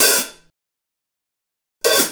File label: Hi Hat Pattern